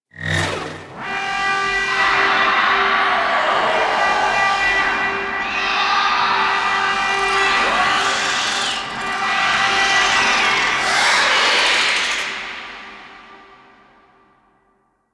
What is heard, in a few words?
Infested Defense Start Waves; Missions; Corpus